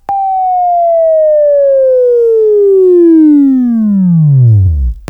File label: Buzz